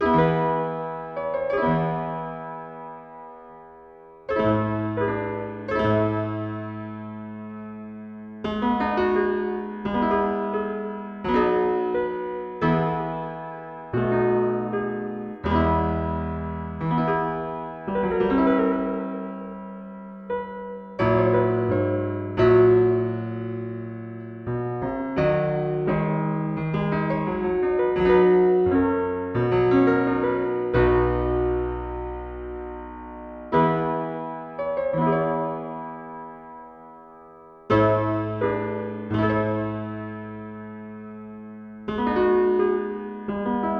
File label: sarabande